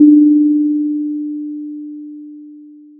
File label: Sound of a church bell